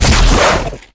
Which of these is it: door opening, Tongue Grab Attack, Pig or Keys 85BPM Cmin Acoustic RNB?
Tongue Grab Attack